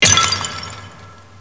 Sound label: vase; thief